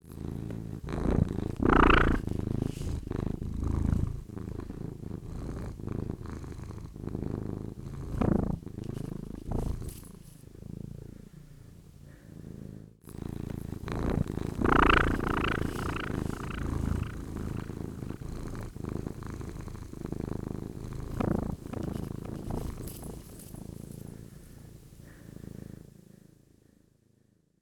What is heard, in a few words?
purr; Lola